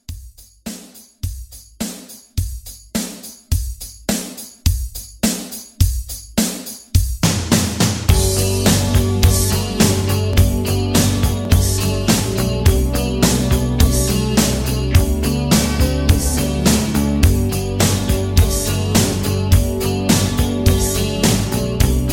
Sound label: 80s Pop Rock 105